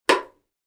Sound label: can down; drinks